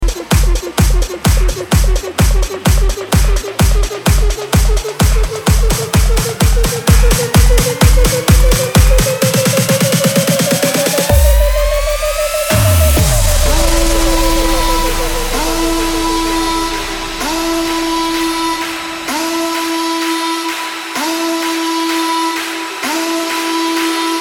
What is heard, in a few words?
BIGROOM BOOTLEG FESTIVAL, 128BPM